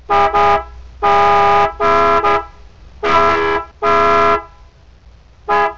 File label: CAR HORNS